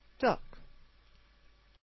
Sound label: duck